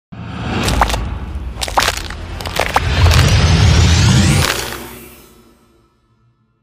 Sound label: egg hatch start